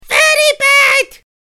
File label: fail; freak fortress 2; cream